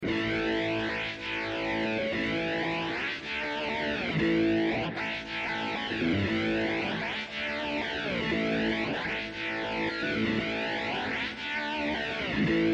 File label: mod dist